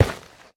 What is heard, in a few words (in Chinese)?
菌岩踩踏声